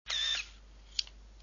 texture appareil photo

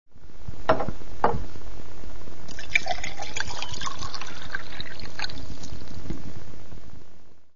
liquide glouglou verre

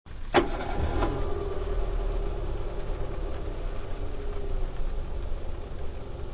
auto demarrage 2

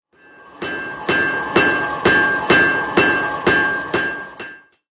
battement sans titre

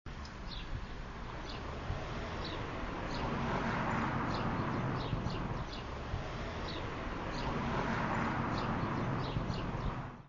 ambiance route

